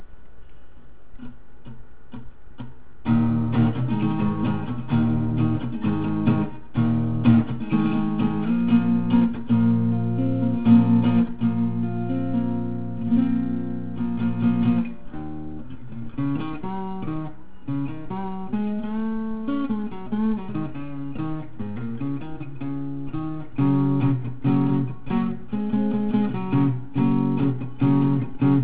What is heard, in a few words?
Raga